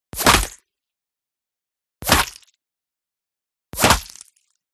Bullet Small Impact Body Flesh; WEAPONS

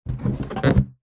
foley balloon inflated short handling squeak; zapsplat

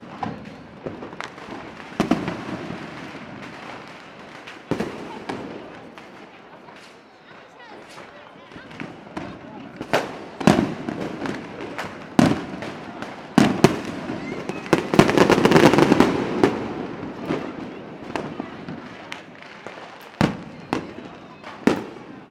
fireworks mixture urban; sound effects one

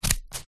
office scissors cutting cardboard single

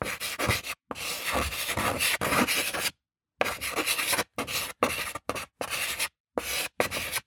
Handwriting Chalk; soundbits

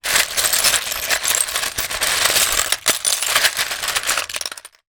shells movement many; foley; zapsplat

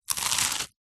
human eat bite toast single

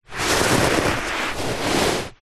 hand movement scrape carpet; zapsplat; foley

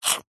cartoon suck med short; zapsplat

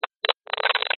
cb radio static interference burst

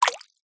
nature water drip close up

